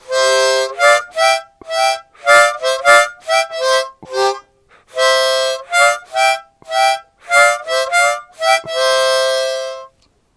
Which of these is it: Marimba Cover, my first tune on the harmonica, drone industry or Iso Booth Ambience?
my first tune on the harmonica